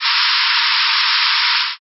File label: faucet